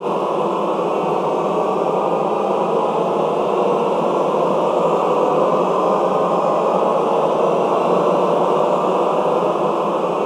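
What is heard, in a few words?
Glissando ENDL, Classical Choir; Best Service; Extended Classical Choir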